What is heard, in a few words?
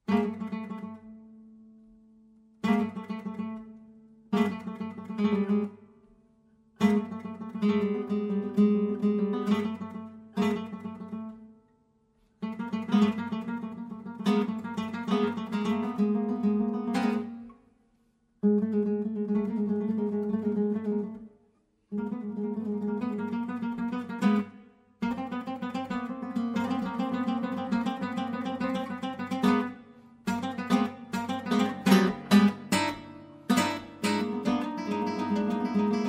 arco